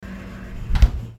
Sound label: refrigerator door close; hollywoodedge